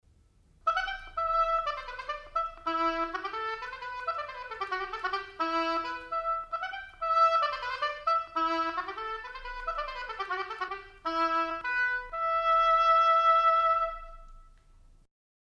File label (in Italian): oboe solo; strumenti